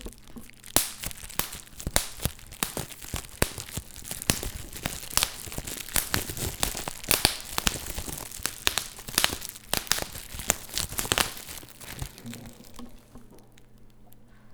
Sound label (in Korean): "으이"